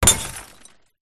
impacts glass bottle smash on other glass bottles and jars; zapsplat